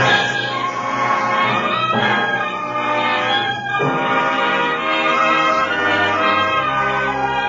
128 phasing oldtime radio drama, STRINGS ORCHESTRAL CLASSICAL LOOPS